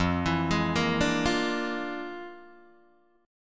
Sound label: F Minor Major 13th, Guitar, DADGAD